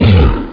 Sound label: Melee; PC Gamer 2.14; shambler